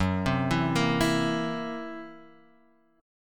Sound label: Gb-7th Flat 5th, guitar; arpeggiated; JGuitar